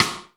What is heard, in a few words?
GO GO DRUMS, STIK1; Crypton - DrumBase5000